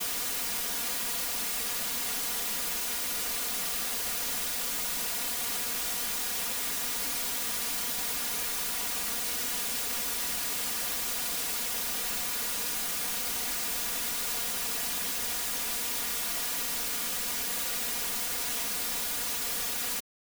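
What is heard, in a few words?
noise identical both channels 1ms delay one channel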